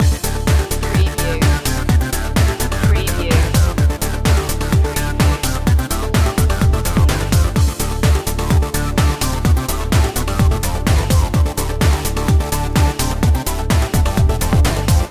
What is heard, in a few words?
synchro express loop